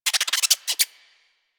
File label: Forest Rodent Vocal Captured Idle; Warframe; Hunting Critters